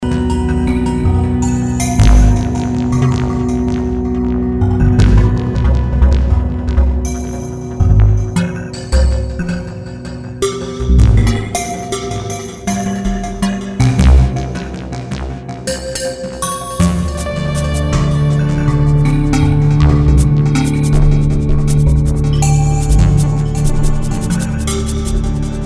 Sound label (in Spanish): Electronica ejemplo